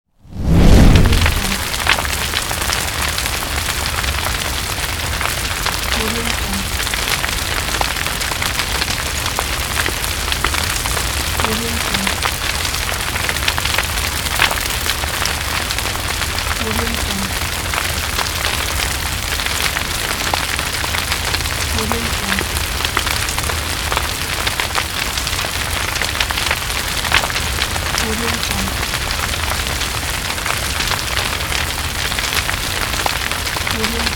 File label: fire